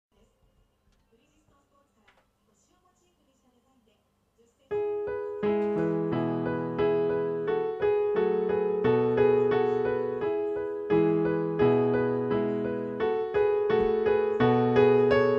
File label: Karaoke